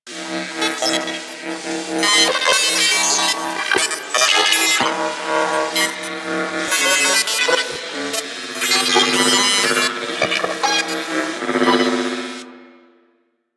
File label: Gas City Holo Screen Loop; Ambience; Props; Warframe; Corpus Gas City; Props Remaster